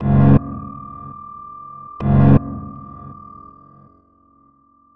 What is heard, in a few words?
suspense